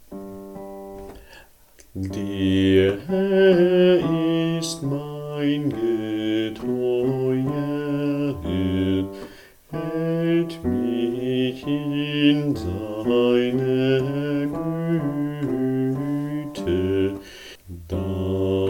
Bass, Kirchgemeinde; Bach

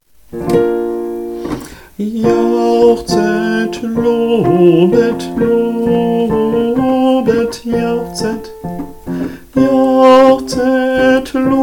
Jauchzet Tenor

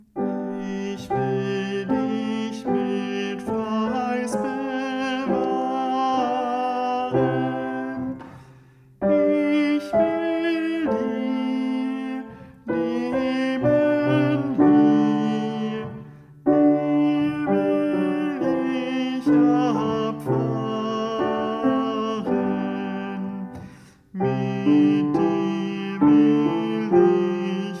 Sopran, bach choraele sopran

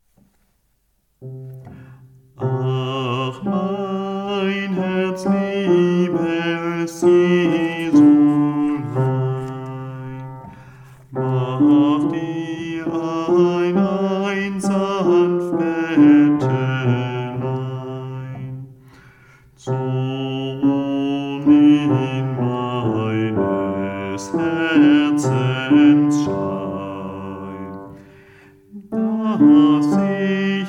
Ach mein Bass

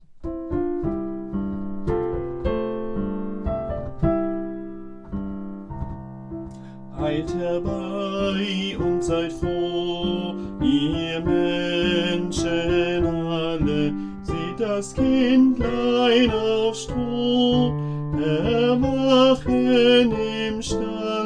Eilt herbei, Strophe Sopran

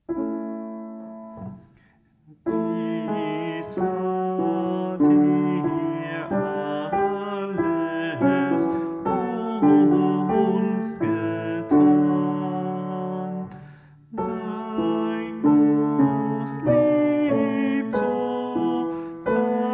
Alt, bach choraele alt

